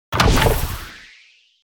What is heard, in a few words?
Chest open fail reward